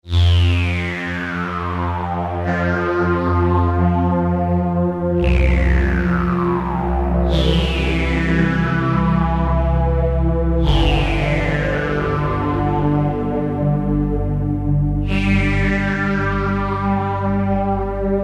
D-50 spacious sweep

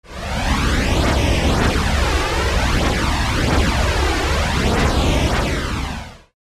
Synthex chorus

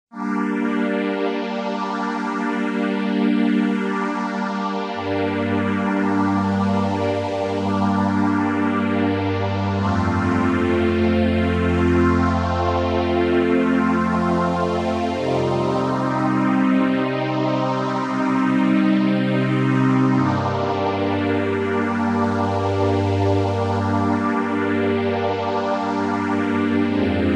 virus kb pad

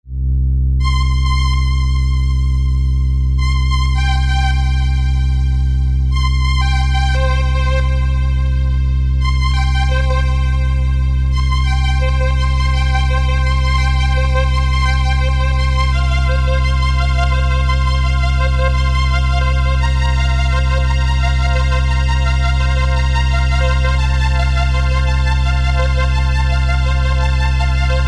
Eminent 310

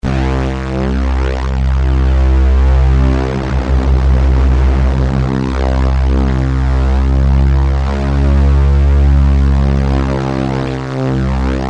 d-50 oberheim

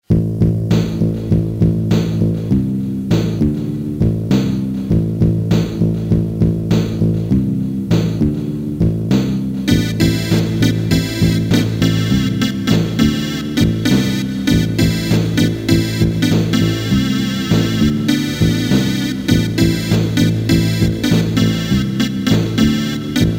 Casio SA-1 rock

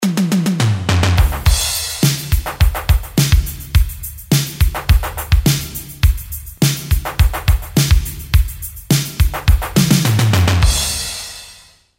linndrum

